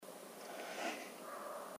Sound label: possum